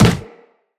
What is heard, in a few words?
Walk Wood, Footsteps; Operator